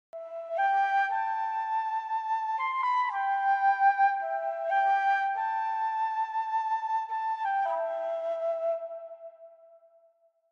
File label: melody instrument; Walden